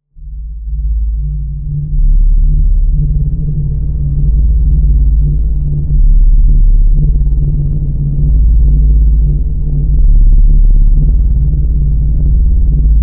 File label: Flux Atmos